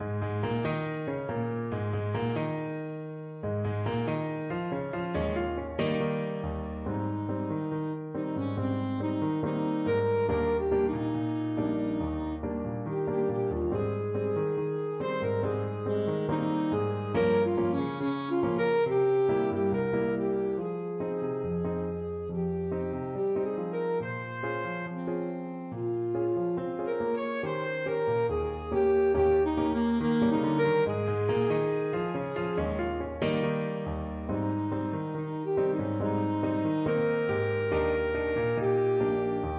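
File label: bossa, alto saxophone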